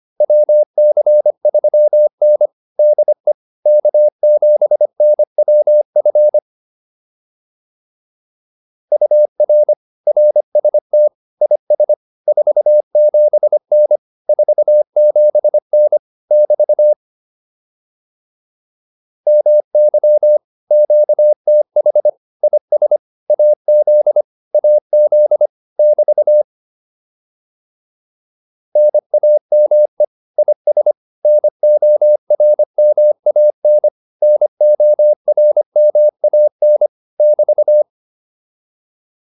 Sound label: QSO, advanced practice files